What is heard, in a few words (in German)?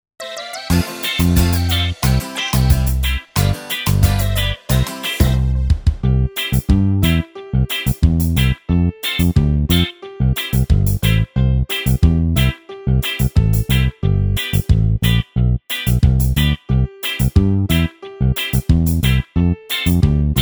Karaoke